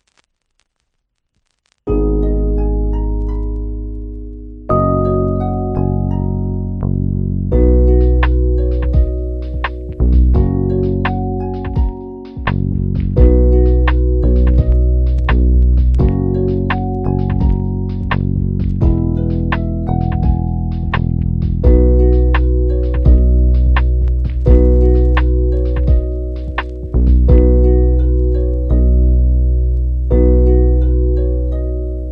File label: so sad town